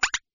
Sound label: step dog